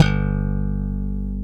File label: CHOP G1, bass